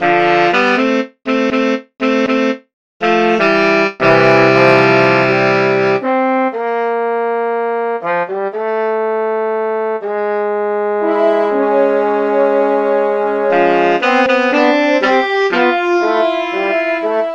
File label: Big Brass